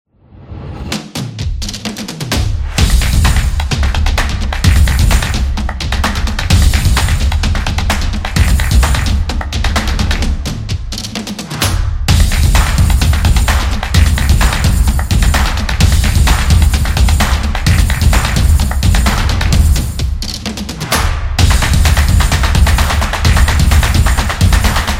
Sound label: The Beat; high energy